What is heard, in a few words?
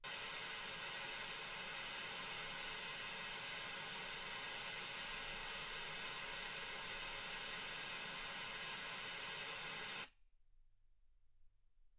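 white gaussian noise 48kHz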